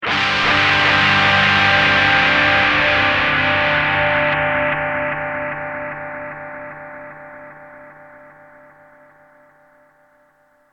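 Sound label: guitar, AD202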